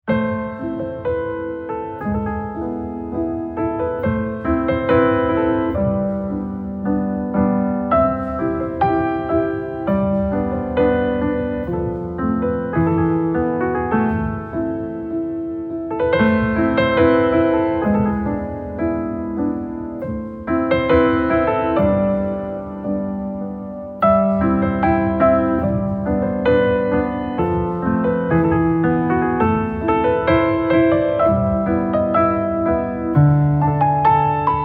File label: HYBRID KING PIANO; ALBOOM